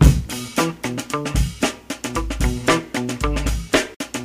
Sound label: 113 bpm fresh drum loop sample b key